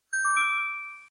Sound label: Chime